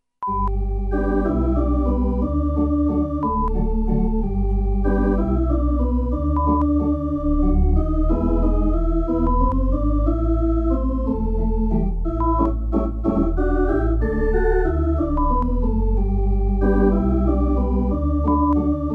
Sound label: baseball Music